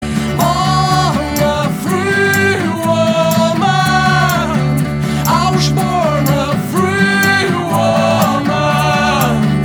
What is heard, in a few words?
Ringtone